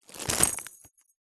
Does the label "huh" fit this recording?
No